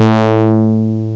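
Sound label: brass synth, ANALOG; CMI Sound Library Rev 1.3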